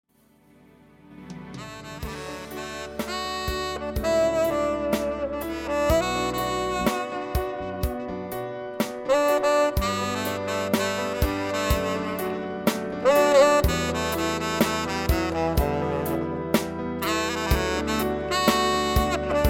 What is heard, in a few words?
Saxophone